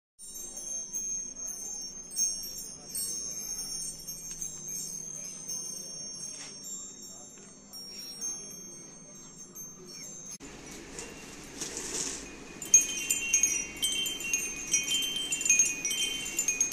Wind Chimes

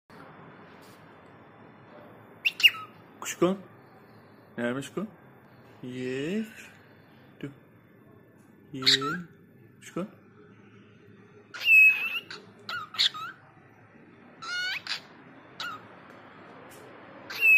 cockatiel training; aroos holandi

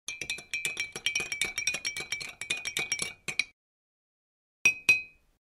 Stirring with spoon

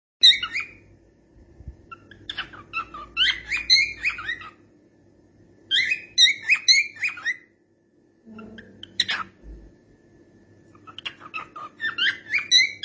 cockatiel; chiko

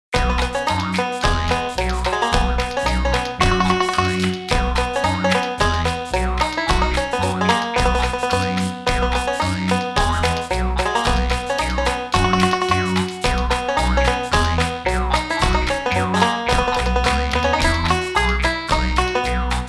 Banjo; The Muffin Tops; Seinfeld Soundtrack

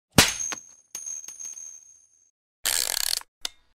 Mouse Trap

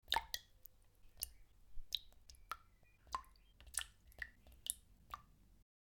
water drops

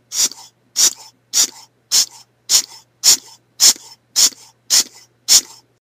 hand pump

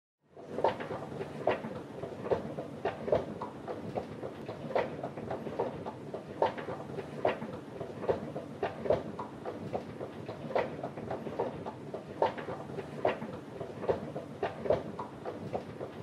Escalator

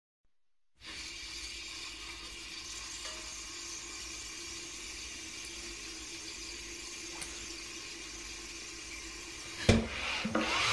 steam iron

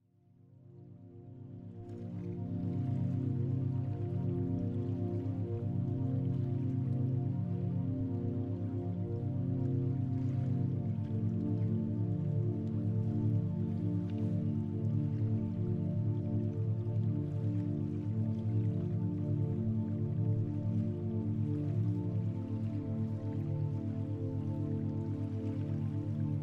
Alpha healing frequency; Alpha waves

